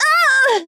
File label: Ranger Death